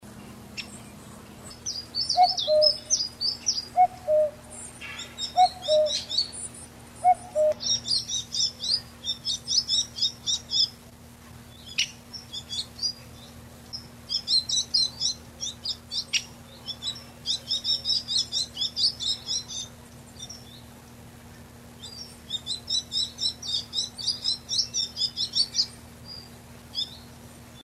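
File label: Phylloscopus collybita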